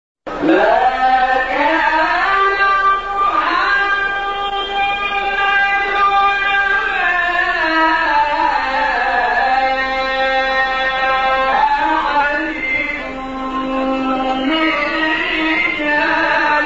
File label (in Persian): فراز زیبای آیه سوره; احزاب; متولی عبدالعال